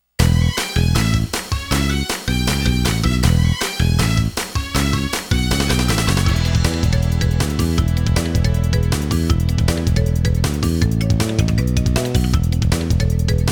Race Win Fanfare; crash team racing

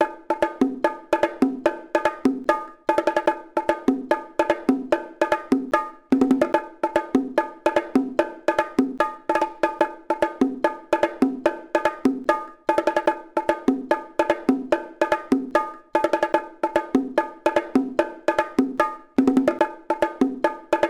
Bongos riff; Sesame Street; Elmo's Musical Monsterpiece; NDS

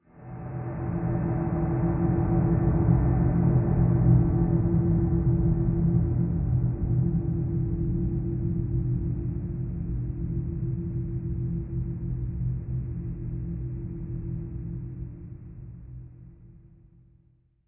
Stingers

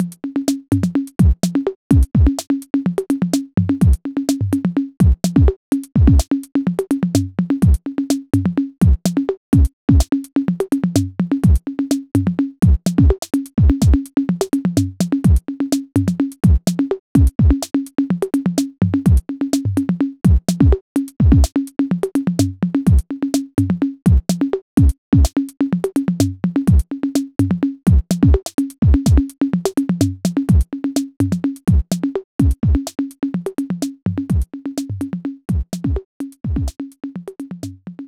BGM Solo; Irodzuki Tingle no Koi no Balloon Trip; NDS

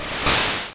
Water Gun; sfx; attack moves; pokemon; gen 1; rby

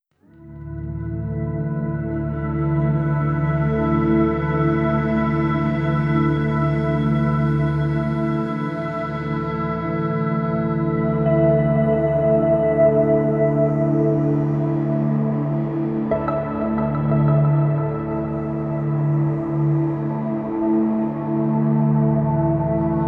Productive Ambience; shenzhen i o

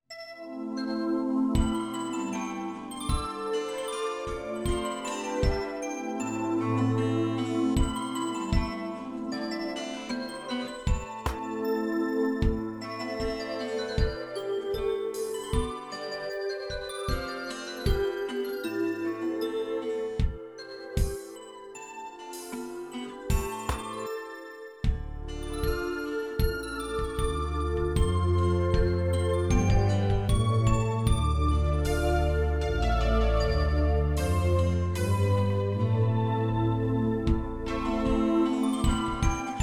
Music Game; Elvenland; NDS

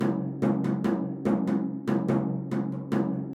Buben Loop

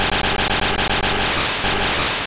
Bubblebeam; attack moves; pokemon; gen 2; gsc

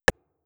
Warm Tap

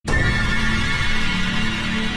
SHOCK; F.E.A.R. Perseus Mandate

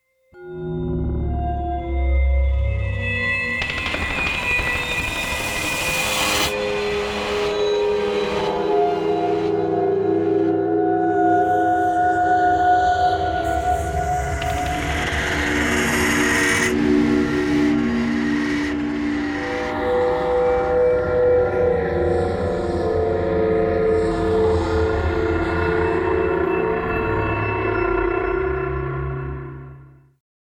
Descent; Inversion

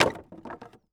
IMPACT Wood Plank On Wood Pile; Infernal Radiation

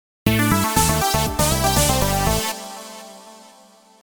Game Over Comical

